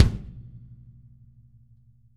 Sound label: L KICK, Interactive Drum Kits; Sonic Reality